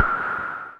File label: noise fx; Masterbits; Switch FX